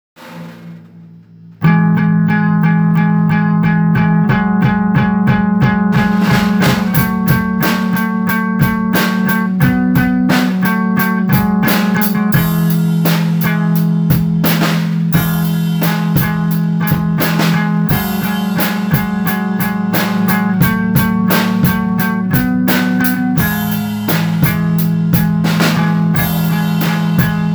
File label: improv c minor